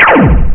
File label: Spell Launch; Realmz 4.2.2